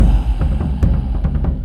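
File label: Music Shield; From Dust; PS3; Xbox 360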